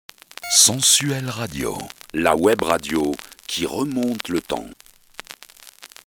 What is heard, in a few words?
jingle; sensuelle radio